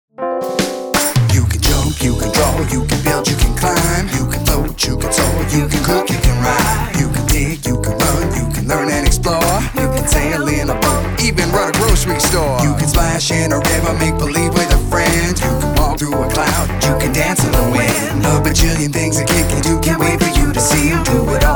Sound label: JINGLE